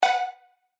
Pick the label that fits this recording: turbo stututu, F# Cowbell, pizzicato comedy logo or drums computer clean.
F# Cowbell